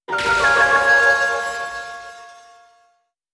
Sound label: Jingle - Locked Room Recreation Complete; master detective archives rain code original soundtrack 2023